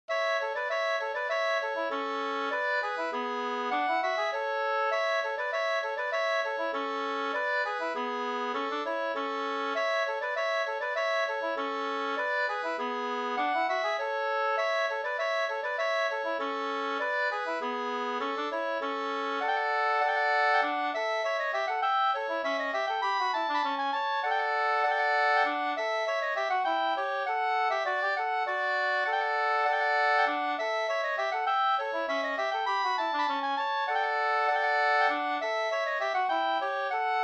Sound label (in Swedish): polka polkett; Goken gal e Nils Jonsson Vb 2st